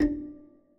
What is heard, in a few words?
synth